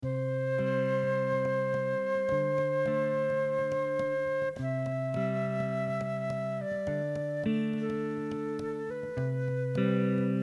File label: Instrumental